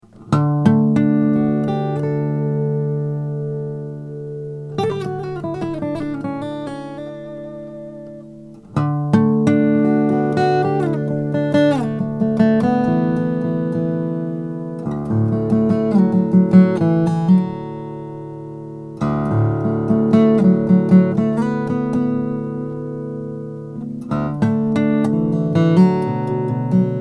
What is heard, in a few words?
hewett om madagascar rosewood